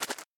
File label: Footsteps Bug Dirt; We Are the Dwarves